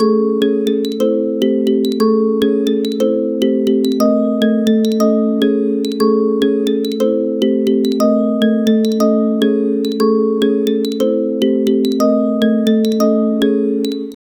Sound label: upbeat repeating melody